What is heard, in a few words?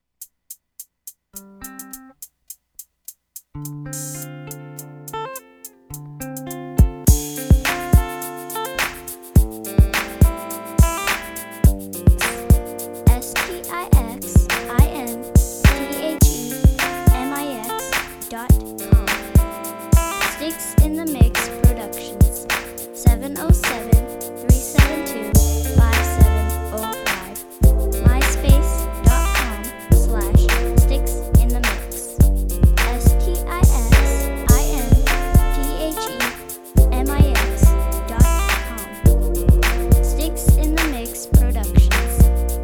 guitar, beats